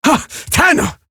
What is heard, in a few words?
surprised, female; Warframe; Eximus Grineer Female; Battle Barks